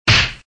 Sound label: Dragon Punch Sound